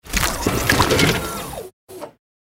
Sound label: win super sfx; Fondant Favourites StarTrail; Eyecon